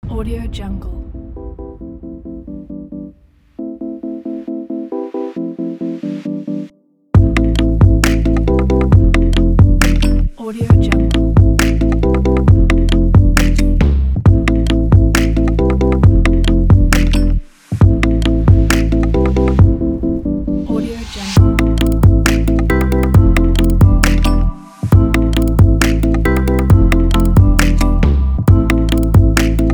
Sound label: abstract design; rarebeats